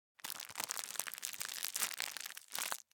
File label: pearl barley open package; sonniss gdc